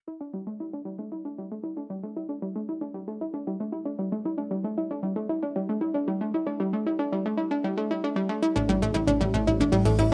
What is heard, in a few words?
Electronic Impulse